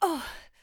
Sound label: Voices Falling; Adam's Venture Origins